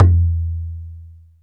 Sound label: Ethnic Perc; Percussion